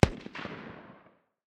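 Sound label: light machine gun far distance; tacz-tweak-pack; taczmechanics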